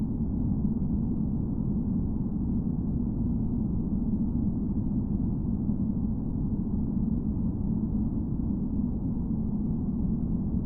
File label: wind